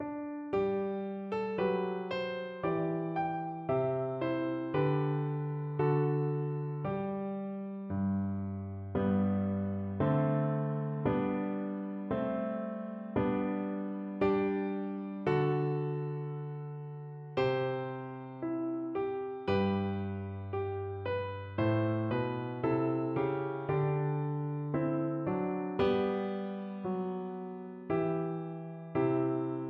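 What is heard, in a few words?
recorder karaoke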